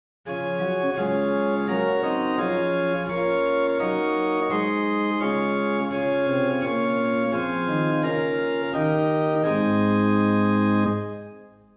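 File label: Rohr 8 + Octave 4, organ stops; Reinhardtsgrimma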